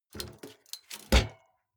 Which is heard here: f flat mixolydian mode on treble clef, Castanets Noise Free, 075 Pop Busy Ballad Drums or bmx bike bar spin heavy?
bmx bike bar spin heavy